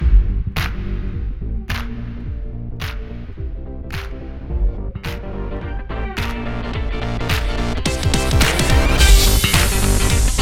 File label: Rhythm Theme, 107 BPM; Yum Yum Cookstar